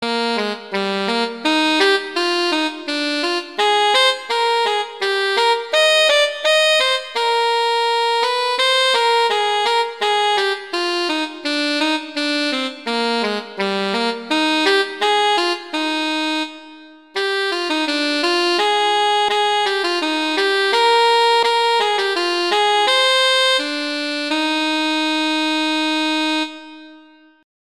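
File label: Minuet, cello; Very Easy Collection; Mozart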